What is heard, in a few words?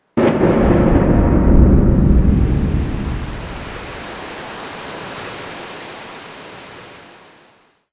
Fall Storm; RoboTutor